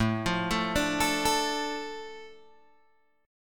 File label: A Suspended 4th Sharp 5th, Guitar Open G